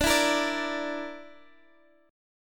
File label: D Suspended 2nd Sharp 5th D, guitar; strummed